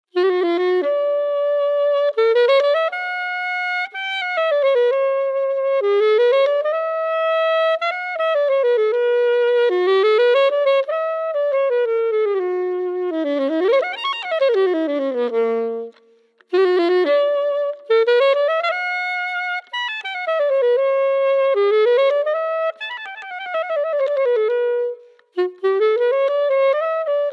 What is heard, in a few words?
Sop Sax